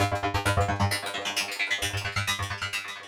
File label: Flanged Rain, techno drum fx; Single Hits